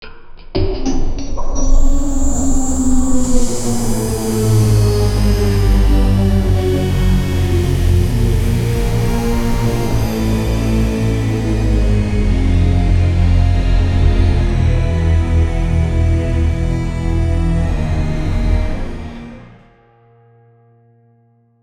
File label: slow starting dark sci fy; cinematic